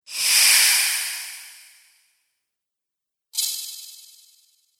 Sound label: Magic Spells; Magic Sound Effects